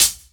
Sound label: Royality free pedal hi-hat sample tuned to the G note. Loudest frequency: 7136Hz; dry hat sample g key; drum single hits one shots